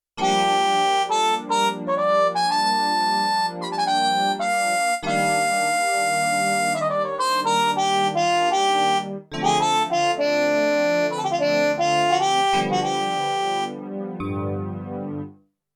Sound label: Carp Trumpet Split; Yamaha TX16W; Big Band Performance